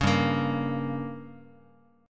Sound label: G Diminished, Guitar; strummed; JGuitar